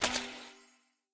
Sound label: spin Button Click; netent; sweetyhoneyfruity